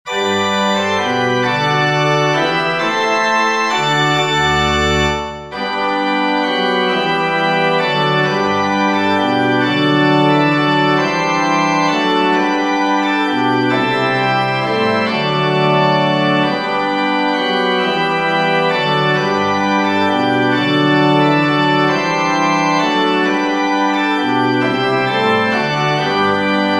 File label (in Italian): organo